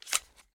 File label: Glock Mag Out